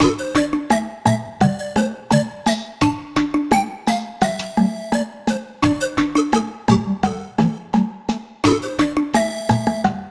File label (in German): MUSIK; MOBICLIC 89